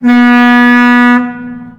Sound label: boat horn; vehicles